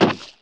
collision

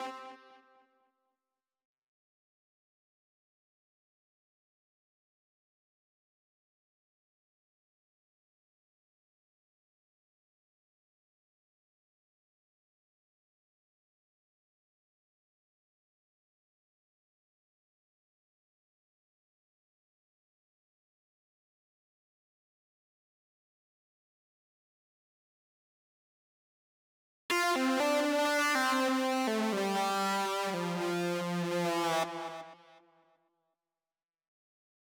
Breakout Mellow Mix Stem Layer; Breakout Recharged

